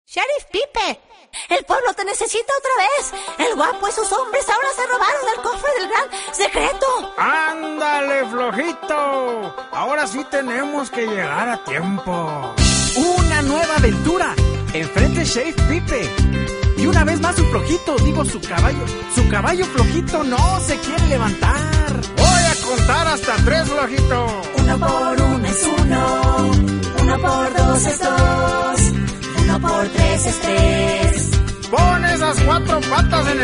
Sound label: pipe